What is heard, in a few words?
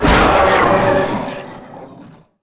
bug thing; starship trooper; deaths; Nejlepší České Hry